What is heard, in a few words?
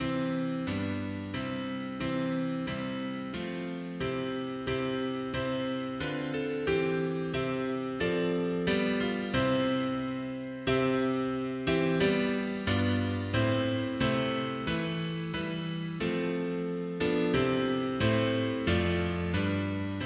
mono piano; hymns; Himnario grande